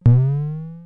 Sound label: Tom, Drumfire DF-500